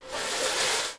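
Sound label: metal slide small; Star Wars Obi-Wan; XBOX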